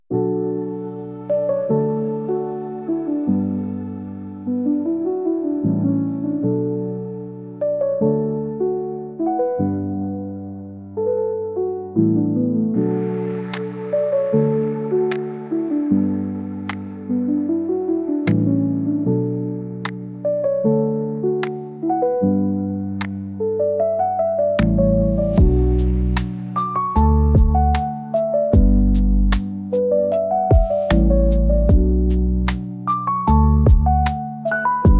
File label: ocean breeze music